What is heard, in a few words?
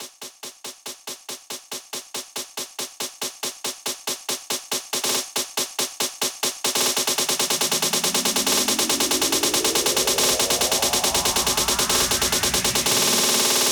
Snare Roll, Drum Loops 140bpm; Zero-G Trance Inducer